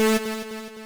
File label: lead a3